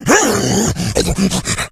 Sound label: rage, infected, male